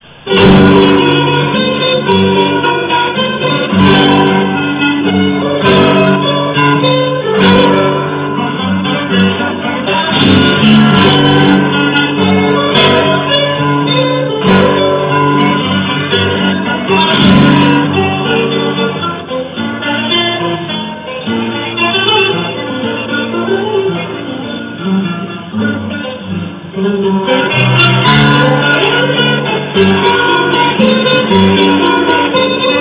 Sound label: sevilla, guitar